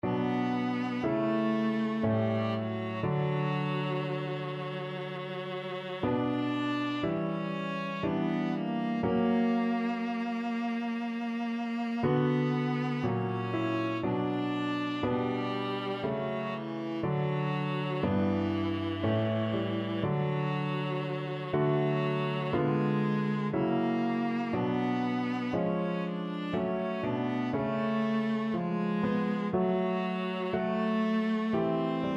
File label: viola